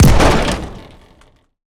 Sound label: mech weapon scifi shot; annihilation; Bluezone Corporation